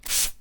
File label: sprayer